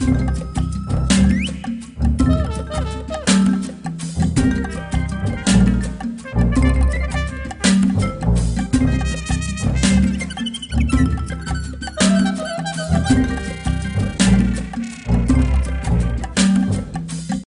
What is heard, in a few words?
Jungle Level